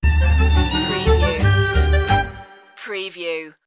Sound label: sting